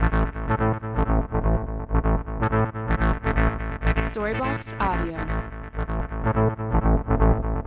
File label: Ambient House Bass 125BPM A